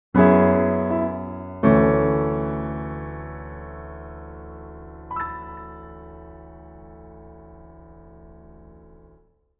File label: jingle